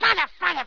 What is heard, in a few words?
swear hit; There; ThereClient